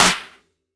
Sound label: Trap Serum Snare